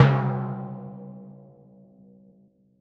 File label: C2 f, Timpani, forte; PowerFX; Downtown Orchestra; scales